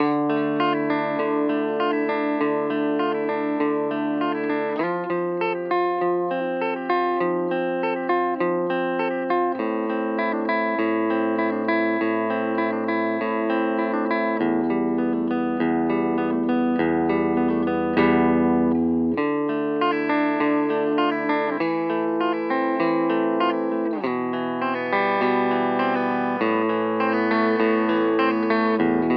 Maybach Harley Benton Vergleich Maybach Clean

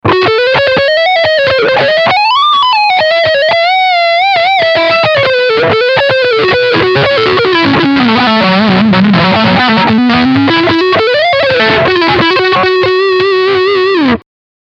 Leadsound Front HB

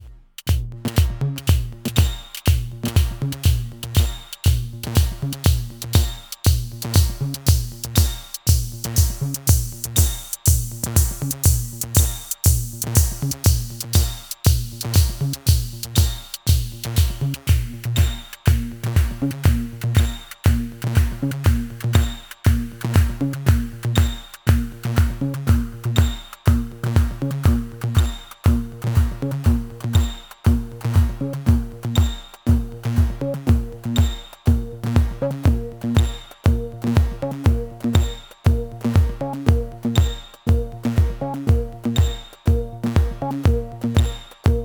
twin VC Filter Zwei Filter gegeneinander

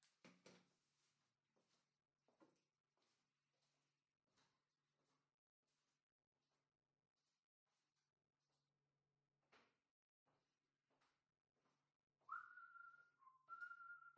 spatial mic virtualisiert schritte in der wohnung; voyage audio

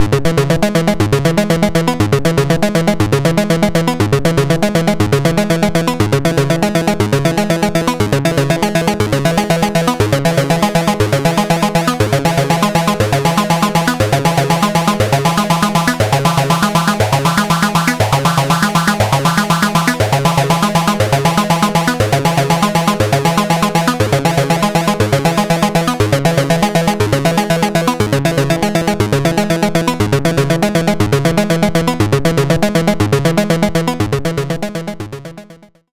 Softube Model 80 Mod auf Filter und Freq1

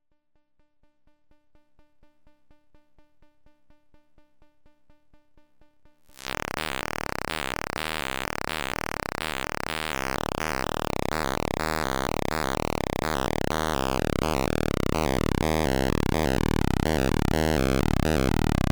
Black VCO Pulse auf Mixer manuelle PWM; ERICA SYNTHS Black System

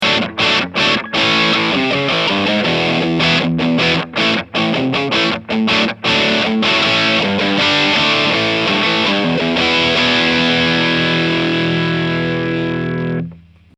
steg hb gain; gunslinger

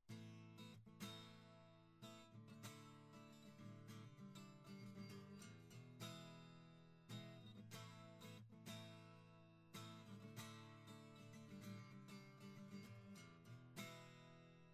Akustik Gitarre

